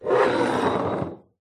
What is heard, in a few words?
pub bottle slide counter; field reports